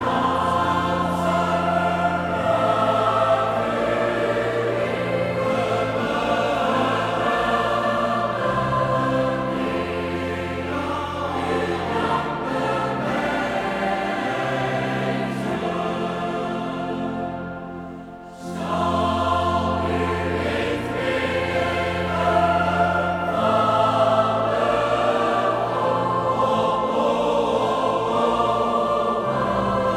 Koor; Psalm 138 1 en 2

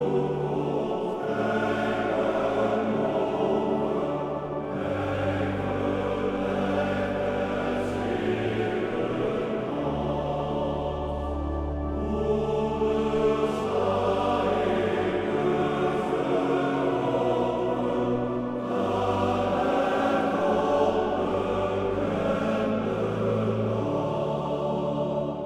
Koor (mannen); Genemuider

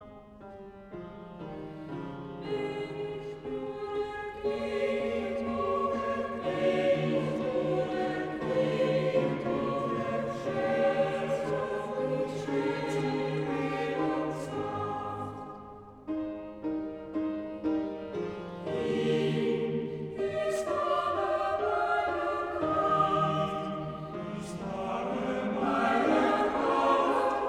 Koor gemengd kamer koor; Amersfoorts kamer koor

